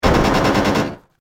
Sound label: Explosive Machine Gun